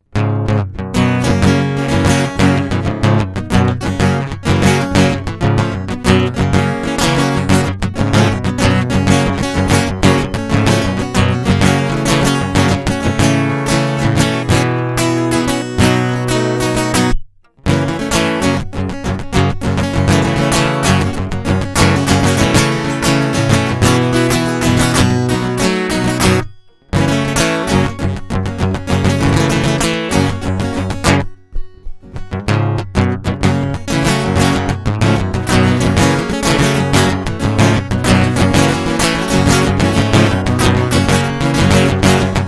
Guitar Loop

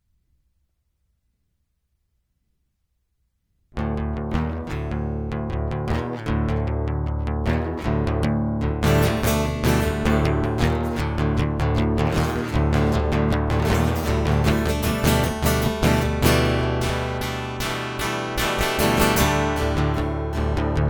Voiced Guitar Loop; hyper terrestrial

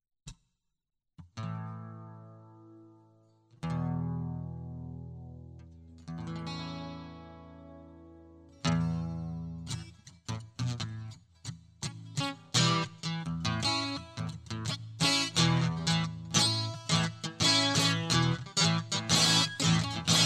Guitar Loop

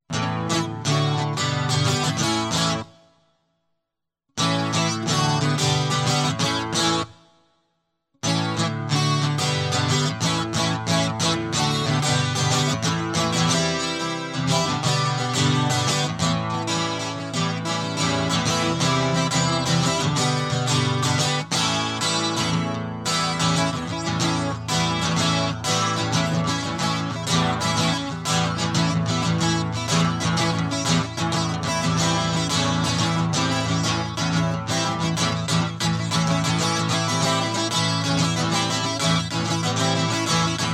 Guitar Loop

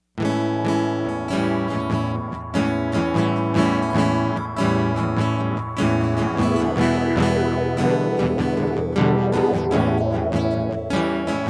Instrumental; hyper terrestrial

